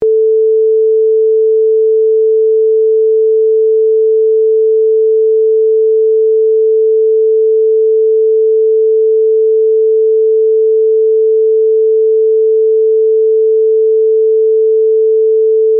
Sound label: sine A440